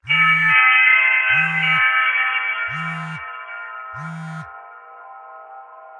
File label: Cellphone Ring; Warren; Gangstar Vegas; Android